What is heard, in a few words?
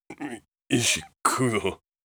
in Pain